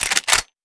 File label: m95 bolt, weapons